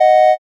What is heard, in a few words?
horn